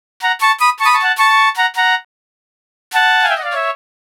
Flutes & Swingers Flutes F#; Zero-G Kocktail Kollection; Construction Kitz (Lounge); Flutes & Swingers 156

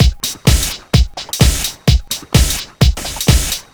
Drums, Drum Loops 128bpm; Zero-G; Soundclash Nu Dance Electro; Acidized Wavs; Das Groove

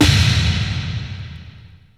prc f#1, CASIO FZ-1 Special FX; Retro Sampler

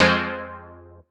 stab grease F; stabs

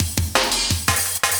loop, Drum & Bass, 170 BPM; Ueberschall; Drum & Bass Flash Trackz

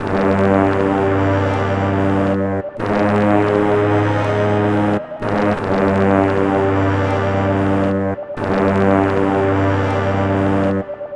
Melody, Hip-Hop

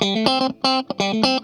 STRUT, Funk Guitar; 90 s Sample CDs; Zero G

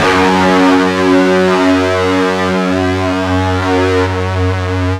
FUZZ F#1, Guitar; East West; Steve Stevens Guitar Samples Collection

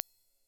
BRUSH CYM, Drums; ILIO; Double Platinum Drums 1

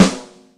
Blue, Yamaha DTXpress Acoustic Snare

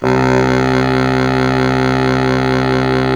SAX Bass Sax C 2

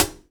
Y9 Hat closed; Akai MPC500

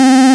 Synths Root C

